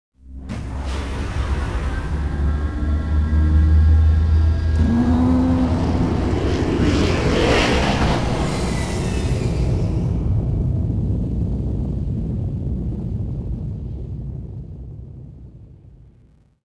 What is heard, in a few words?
takeoff Cruiser; vehicles